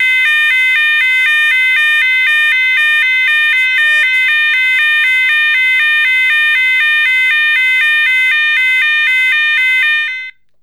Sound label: Sounder; Weather Proof Sonos Electronic Sounder SS240W; High/Low Fast Two Tone Siren - 510Hz/610Hz